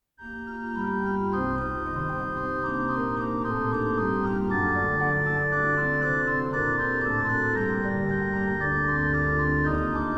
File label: organ; Puer natus in Bethlehem; Bach; Bach Organ Works; Choral Preludes